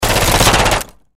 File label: Bullet Impact Wood Fire Multiple Hits; Machine Gun Fire; Warfare